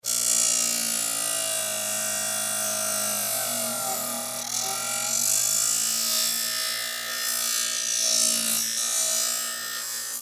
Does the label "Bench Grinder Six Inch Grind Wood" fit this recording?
Yes